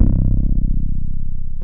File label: BASS BANK, R; Sound Gallery - Charged